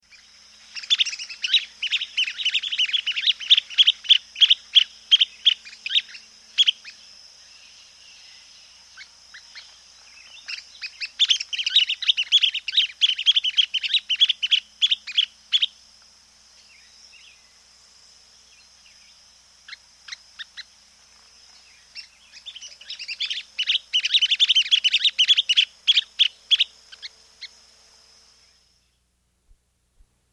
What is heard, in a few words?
Yellow Tyrannulet, S. America, Natures Songs